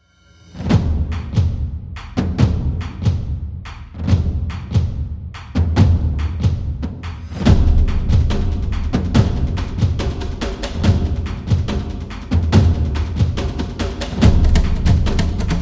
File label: The Cinematic Drums, war drums